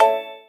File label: correct; AlphaQuick